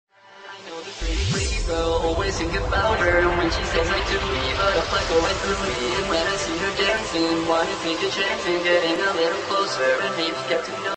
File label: hiphop ringtone